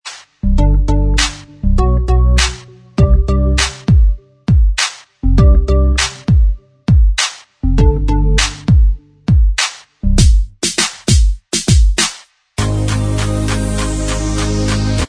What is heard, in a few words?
Inst Track